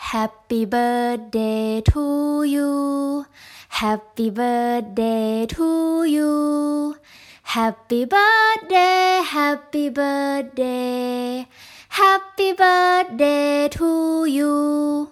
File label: happy birthday song